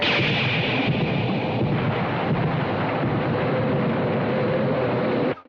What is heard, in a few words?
Roar; Dogora; Showa